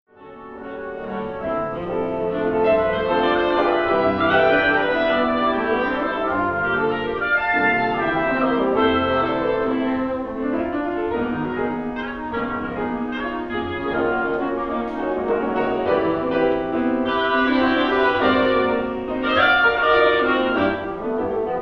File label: funky fugato quartet